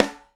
Shimmer, Beyond Snares; Airwindows

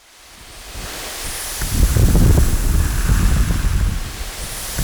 sea; RSS SEA

